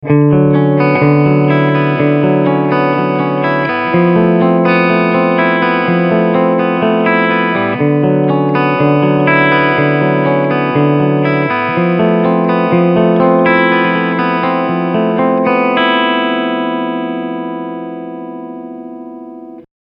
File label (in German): Clean Picking Steg HB